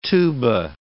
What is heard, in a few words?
tuba